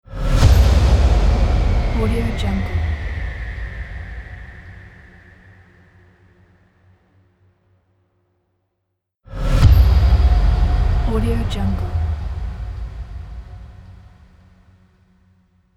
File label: soft airy sweeping impact